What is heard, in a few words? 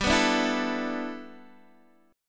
G 6th Add 9th G, Guitar DADGAD; down